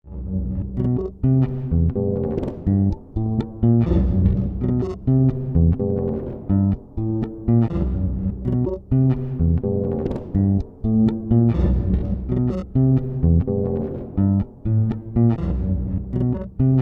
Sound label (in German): fxbox E Bass